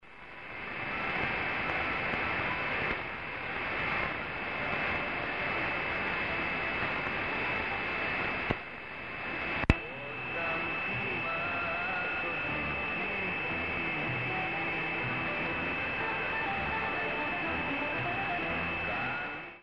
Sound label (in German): Vergleich Langdraht & ALA1530S+